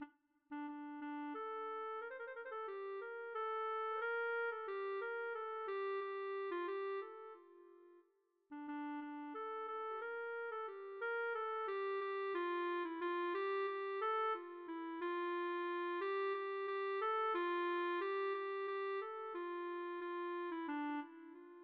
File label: melodica